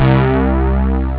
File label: Squares